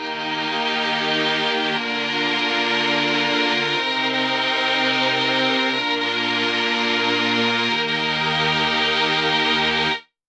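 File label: mellotron 3 violins dry sample; Clavia Nord Wave; no additional effects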